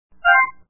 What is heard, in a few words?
gold Get; trogdor canvas